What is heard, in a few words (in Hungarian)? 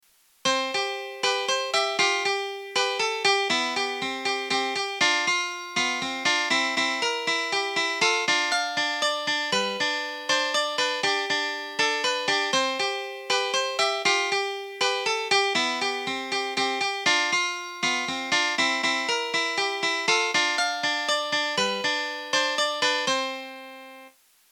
C-dur intro gitar duettre